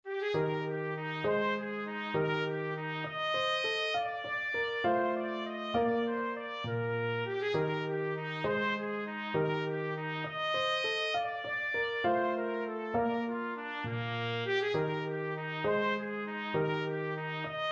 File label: Gigue G Major, trumpet